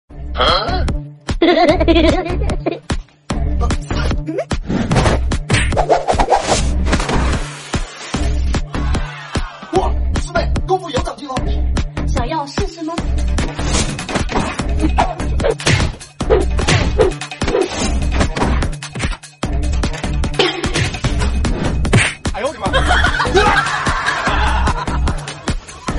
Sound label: laugh; breadradio